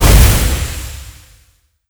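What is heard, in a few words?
boss explosion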